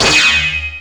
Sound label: PERC FX